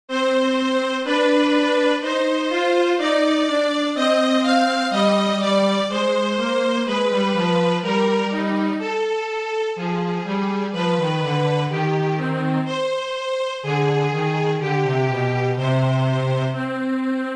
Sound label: string; Palindrom (oda-vissza) dallamok